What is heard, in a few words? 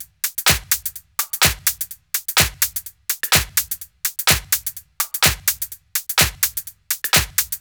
126BPM Drums; Vengeance Samples; Klondike Kit